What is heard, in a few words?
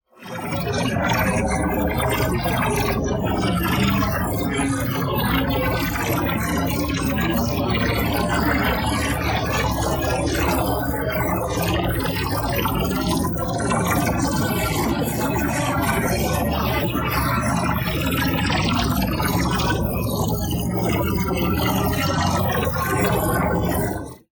ABILITY CHARGE Spectral Fraction; boom library; Matter